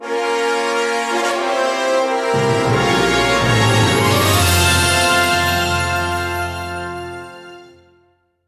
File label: Win; epic mickey wii gamerip 2010; ShadowBlotWin